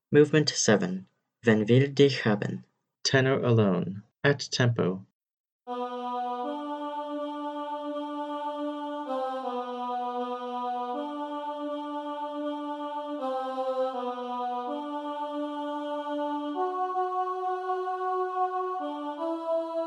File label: tenor alone at tempo, choir